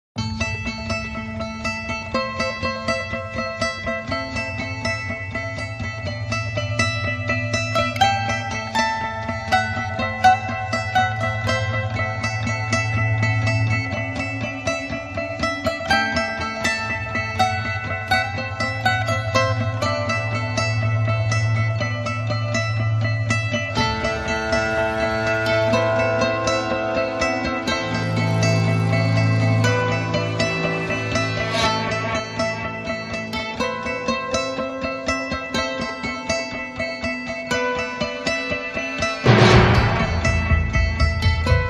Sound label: No Strings